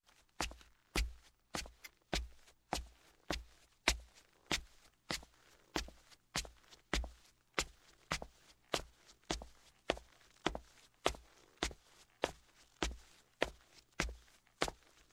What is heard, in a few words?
Footsteps, Wood Up Wooden Steps Sneakers, Slow; Sound Ideas 2000 Series